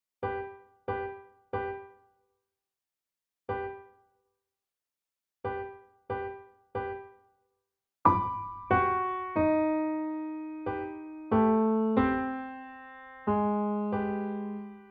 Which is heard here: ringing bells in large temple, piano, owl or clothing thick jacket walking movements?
piano